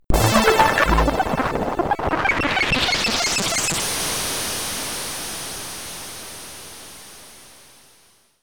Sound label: Stage Clear! (SID Stereo); Great Giana Sisters; commodore 64